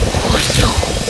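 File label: medic attack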